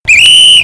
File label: whistle only whistle; rape whistle